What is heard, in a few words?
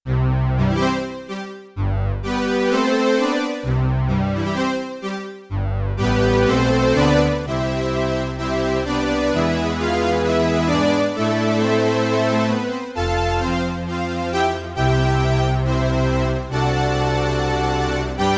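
Octave String Orchestra, Prophet 5; Yamaha TX16W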